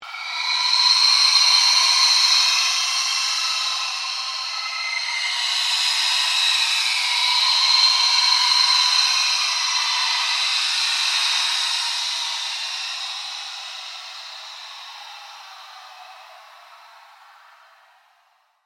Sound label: Ambient Drone Ghost Chatter Scream; Scary Soundscape; Sound effects created by manipulating other sounds